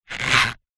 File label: bannanas rub together single creak squeak; food